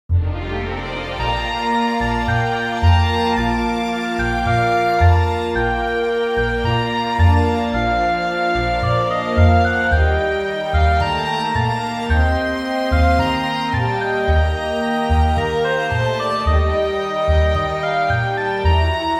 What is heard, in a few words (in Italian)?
Coda Orchestrale; Spettacoli Teatro